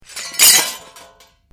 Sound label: China cups dropped metal tray; china cup breaking; Drops; Objects